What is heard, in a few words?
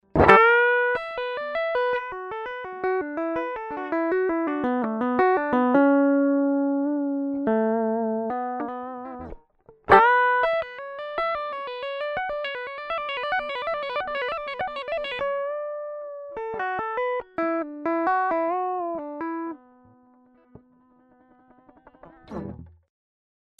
Extra gain space